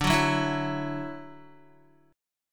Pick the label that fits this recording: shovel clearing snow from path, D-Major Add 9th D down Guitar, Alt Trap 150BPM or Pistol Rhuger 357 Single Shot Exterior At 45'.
D-Major Add 9th D down Guitar